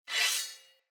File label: Sphere Dual Sword Accent Blade; Weapons; Player; Swords